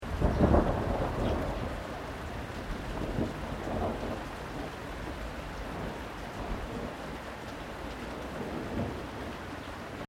THUNDER DISTANT LOW LIGHT RAIN BIRDS

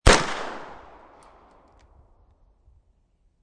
MP5 9mm Field, Machine Gun; MP5 9mm Field Fire; Machine Guns; VRSonic

